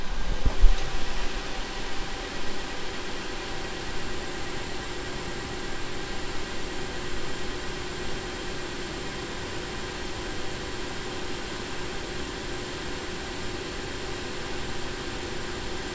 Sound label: Ambient Server Room Tape Drive